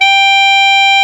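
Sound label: Bb ff Clarinet B3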